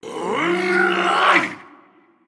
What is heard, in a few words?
growl; Darkseid